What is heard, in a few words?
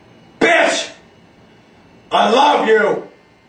notification sound; tourettes guy